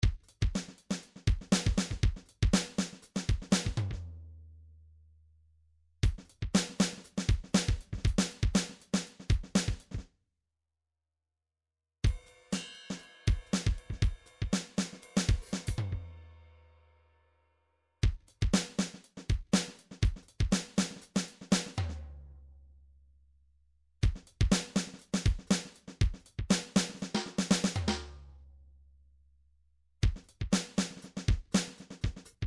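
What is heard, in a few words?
drums pattern, midi; Paul Kodish Dangerous Drums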